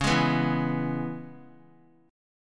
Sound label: Db Minor 7th Db down, chord; strummed; JGuitar